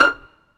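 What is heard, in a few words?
STRINGS PIZZICATO F5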